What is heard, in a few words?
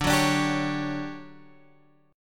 D Major 11th, chord